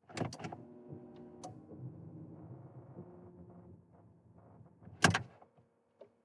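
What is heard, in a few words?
sports car interior ignition on off short; verkehr transport; autos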